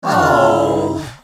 Crowd sad reaction

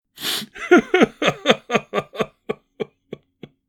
Senior male crying

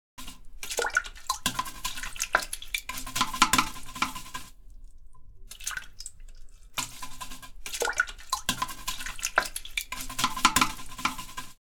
Toilet brush scrub cleaning

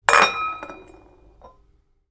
Wine glass falling without breaking

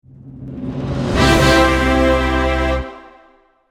Ta da reveal; film and tv

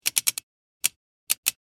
Safe vault dial lock turning click

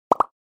Funny pop up

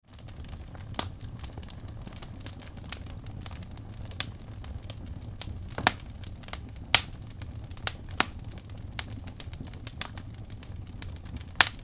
Crackling and burning birch wood

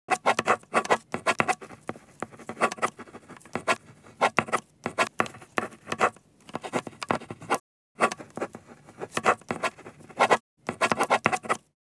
Pen writing on paper

